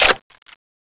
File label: clip in, weapons m4a1